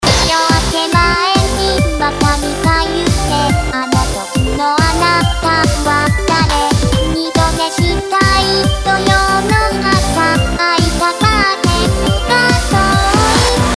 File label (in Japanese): コード から メロディ 例 Ａ